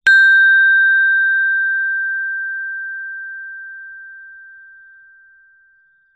musical instrument gender gamelan single mallet hit high mid